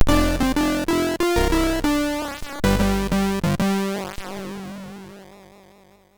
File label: Lights On, MOS6581R3